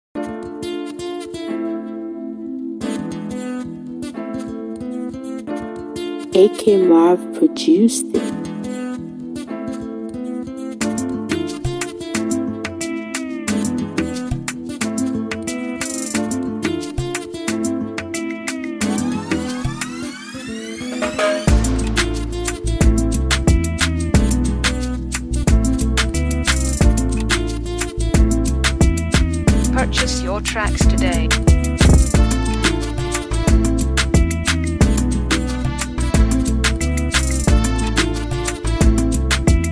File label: Afro Swing Instrumental